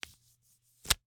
Slap bracelet, smack, slap, whip, felt, plastic, unravel, release; Eiravaein Works; Vaeyan IV